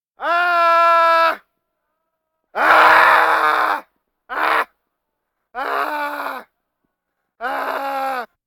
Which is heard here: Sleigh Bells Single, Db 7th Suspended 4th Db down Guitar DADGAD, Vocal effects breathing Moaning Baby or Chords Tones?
Vocal effects breathing Moaning Baby